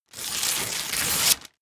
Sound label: tear